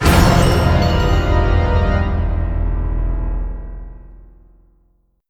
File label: JINGLE RESULT FAILD; Titan Slayer